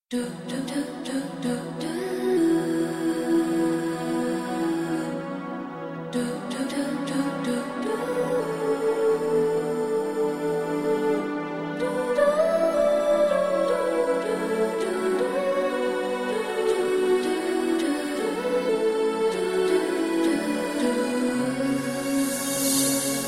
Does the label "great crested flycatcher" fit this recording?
No